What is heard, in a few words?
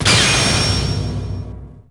SE GAME BREAK; maimai